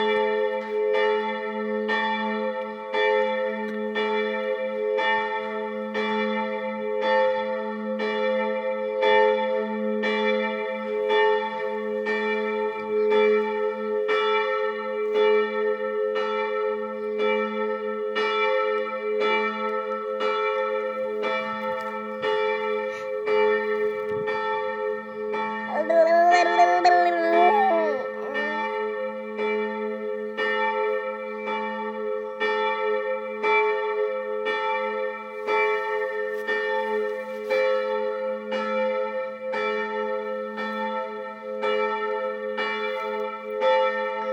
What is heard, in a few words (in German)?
19 Uhr Geläut mit Kommentar